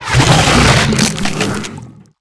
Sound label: worm striking arch